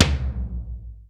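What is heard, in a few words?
DRY KITS, dry tom; Crypton; DrumBase5000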